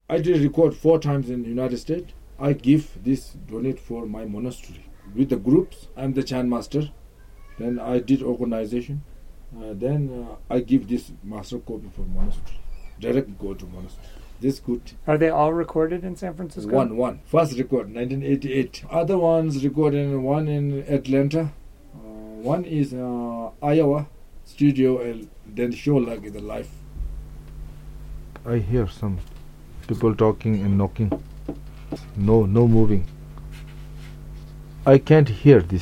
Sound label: chanting; Recording In USA; chanting master